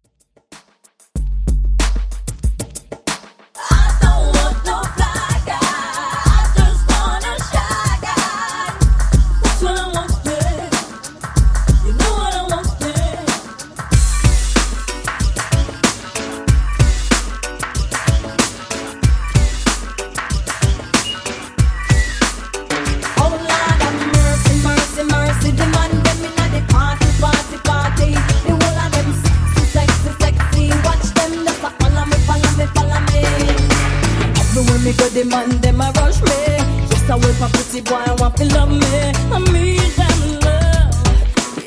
Dj Intro Outro 94Bpm

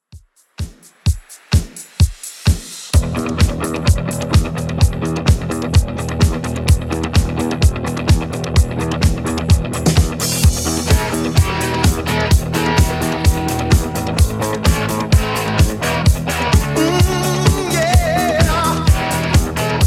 80s Rock Redrum 128bpm

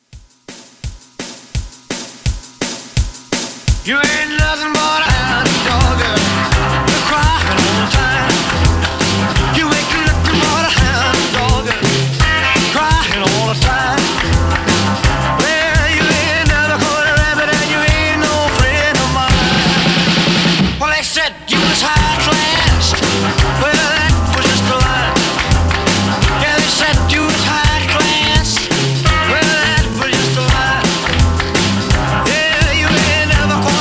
50s Rock N Roll Re-Drum 169

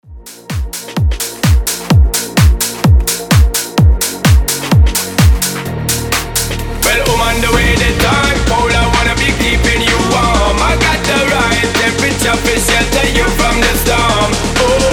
electro house 128 bpm

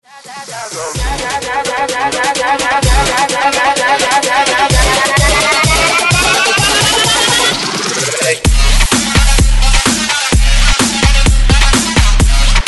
128 BREAKMIX

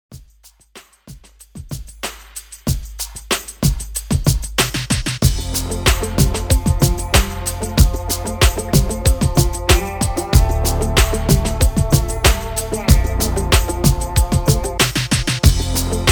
REDRUM, 94 BPM

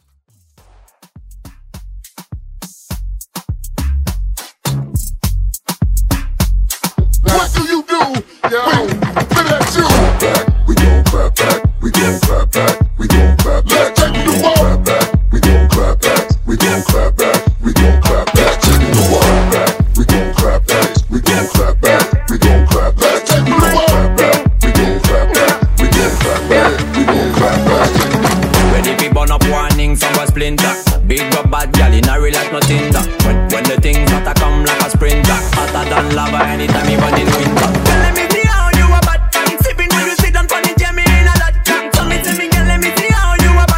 Moombah Clap Back Intro Blend

